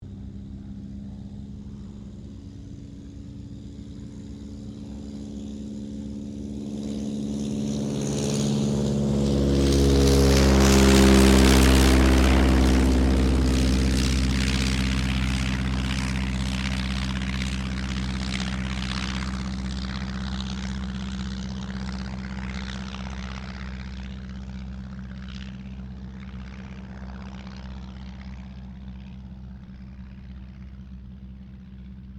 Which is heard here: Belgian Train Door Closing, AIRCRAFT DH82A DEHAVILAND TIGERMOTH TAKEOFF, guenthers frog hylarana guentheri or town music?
AIRCRAFT DH82A DEHAVILAND TIGERMOTH TAKEOFF